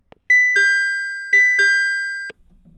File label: chime; 701系ドアチャイム; train